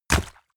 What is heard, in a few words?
horse step water shallow